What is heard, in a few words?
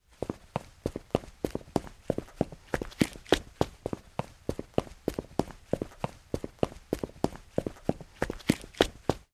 Footsteps Male Jogs